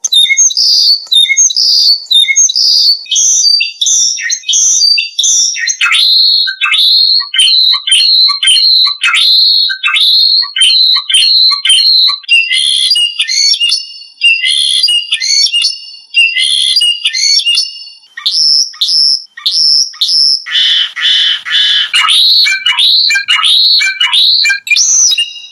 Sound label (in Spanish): Rascada, timbrado español; Αρνητικές νότες του τιμπράδο